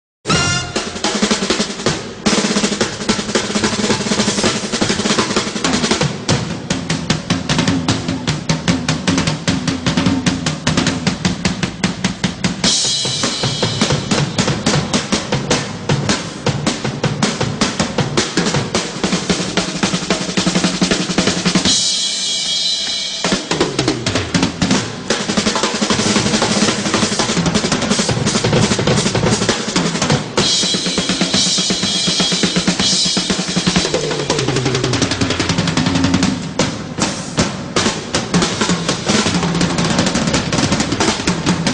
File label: animal drum battle